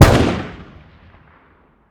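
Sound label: weapon ar fire player atmo exterior; gmod; viper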